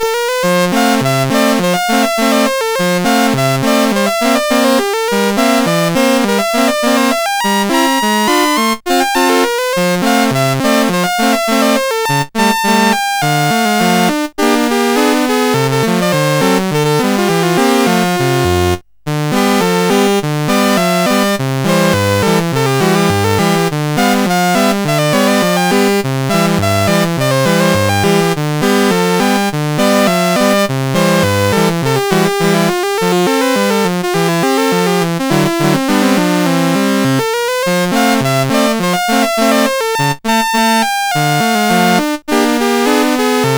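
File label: River Chase, MOS 8580 SID chip music, games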